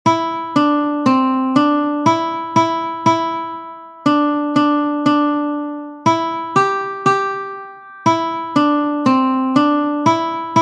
Mary had a little lamb guitar several string

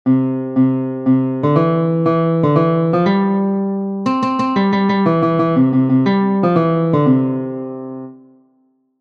row row row your boat guitar several strings

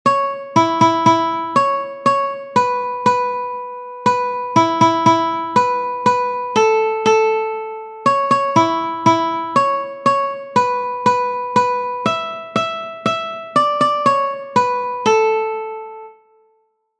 Five Little Ducks Guitar One String

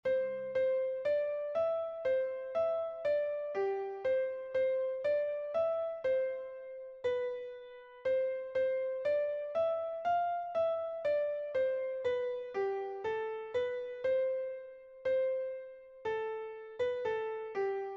Yankee Doodle Piano

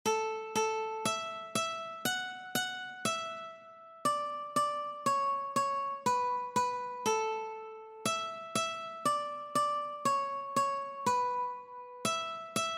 Twinkle Twinkle Little Star Ukulele One String

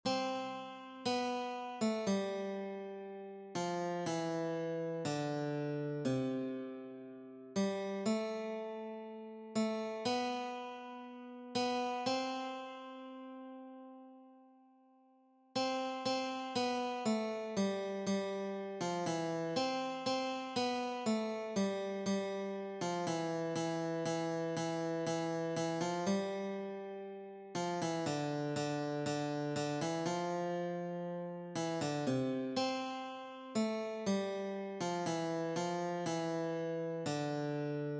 Joy to the world Guitar

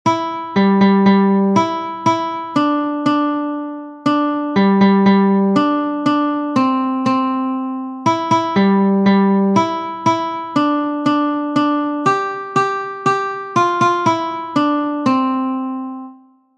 Five Little Ducks Guitar Several Strings

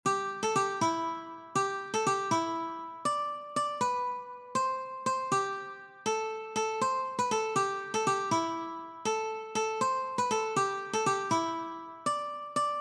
Silent Night Ukulele

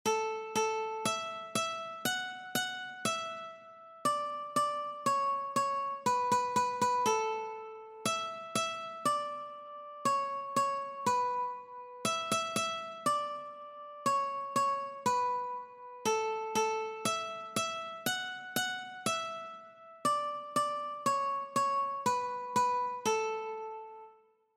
The Alphabet Song One String

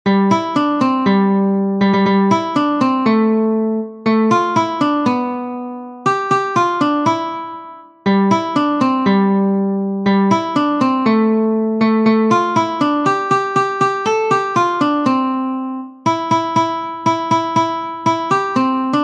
Jingle Bells Guitar Several Strings

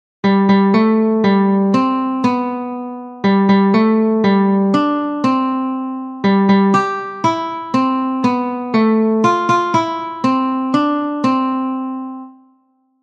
Happy Birthday Guitar Several Strings

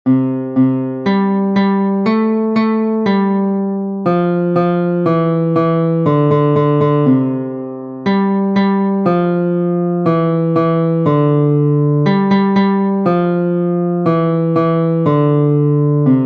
ABC Song Guitar Several Strings

